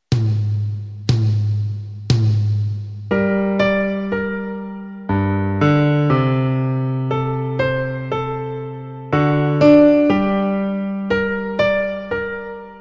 2 voces

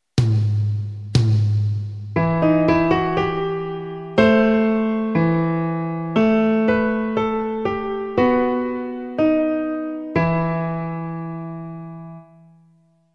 2 voces

